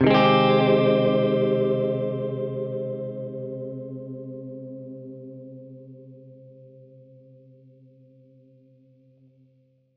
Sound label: Gretsch Chord Cminb6, Guitar Chords; undercover samples